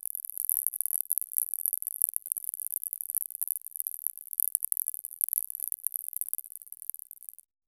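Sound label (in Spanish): sonido de particulas apareciendo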